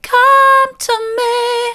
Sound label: come to me soft, vocal